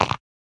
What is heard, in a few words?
stone hit; Geschicklichkeit; Bricks Of Egypt